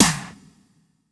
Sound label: Elec Snr, drum